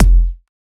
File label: Kick, Dance Drum Kit; Drum Oneshots; Twilight; Monosounds